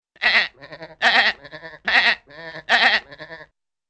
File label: two sheep bleating